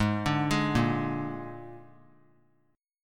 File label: Ab Suspended 2nd Flat 5th Ab, chord; arpeggiated; JGuitar